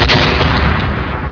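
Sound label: lightning; mk2; Raiden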